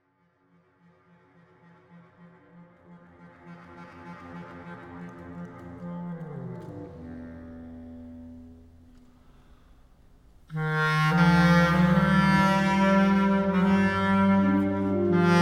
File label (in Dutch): Fragment uit voor basklarinet en kerkorgel